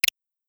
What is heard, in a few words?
timer start